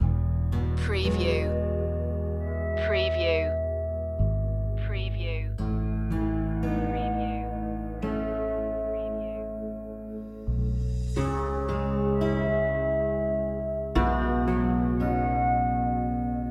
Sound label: beauty and calm no percussion